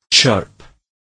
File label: chirp